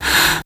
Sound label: VOX; ZERO-G; Datafile